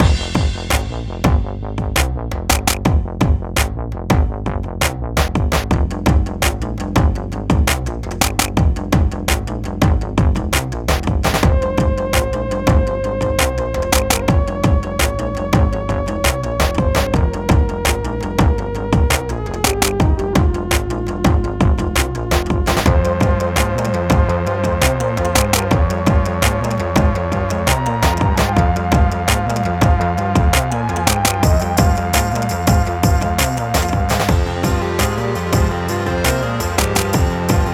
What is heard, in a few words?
Swamp Battle Loop